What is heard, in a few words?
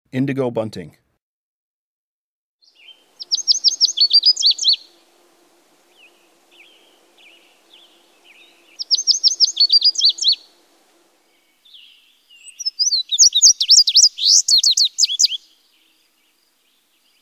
Indigo Bunting; Breeding Birds of the Chicago Region